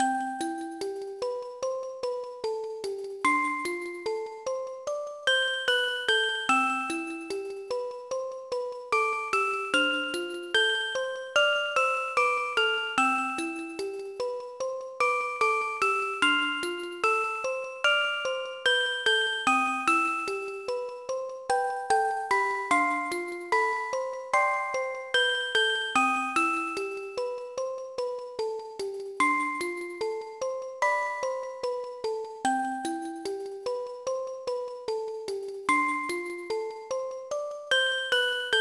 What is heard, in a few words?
Pokégear Radio; pokemon heartgold and soulsilver